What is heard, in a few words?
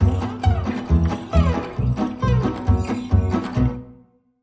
Fast Blues Swing